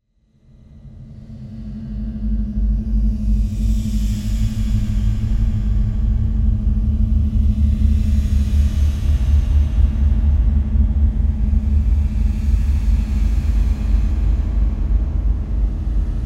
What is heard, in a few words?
Ambience Mystical Scary Ambience Mystical Suspenseful High